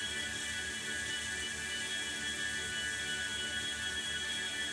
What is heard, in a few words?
medium Win Loop; fairyhansel